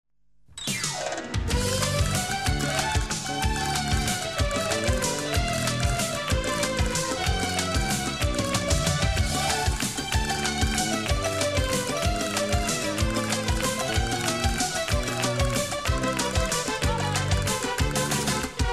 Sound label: Jingle; 40 Principales